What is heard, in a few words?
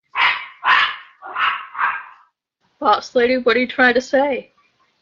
dog bark